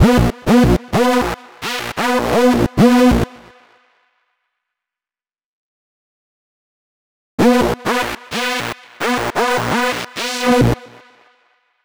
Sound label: Lead, EDM; Sonic Foundry (Sony Creative Software); Afterhours EDM